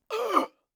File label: death gasp pained gasps short inhales